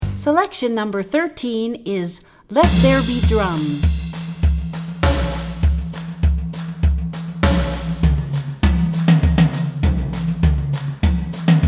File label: Drums